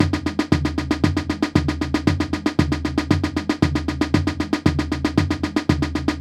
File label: Strait Beat Accents